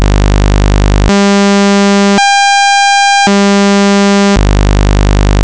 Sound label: saw, vocoder